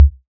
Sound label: Perc Tom Kick